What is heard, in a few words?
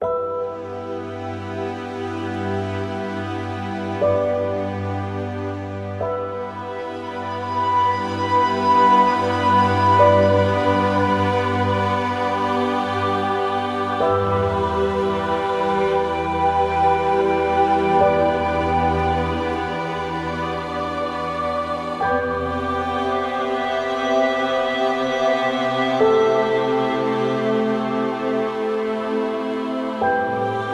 Enlightenment Ambient